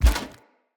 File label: Foley Armour Step; Characters; Enemy; Mockeries; SubBoss; Mockery